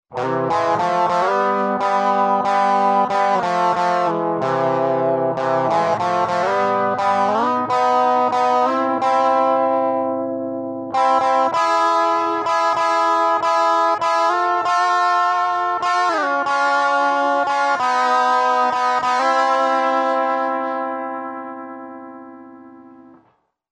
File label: 3RDS, Digitech RP200 Factory Presets